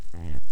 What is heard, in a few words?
Rumble